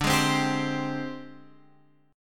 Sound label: G sharp Major 7th Flat 5th